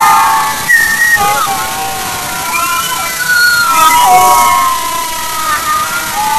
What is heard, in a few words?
aauugghh